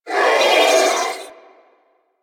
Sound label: Sentient Pain, Barks; Enemies; Symbilyst